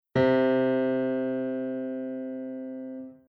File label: bass high scale